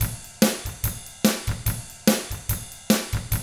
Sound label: ROCK, Double Platinum Drums